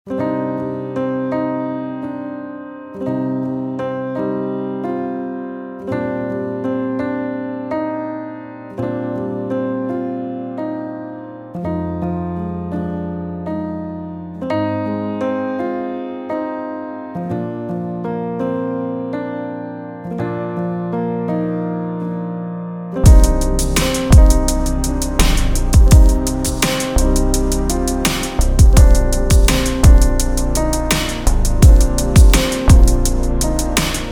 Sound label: Hip hop